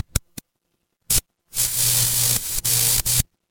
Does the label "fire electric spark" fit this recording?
Yes